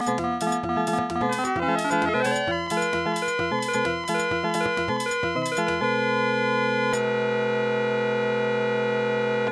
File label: sk-2 crazy band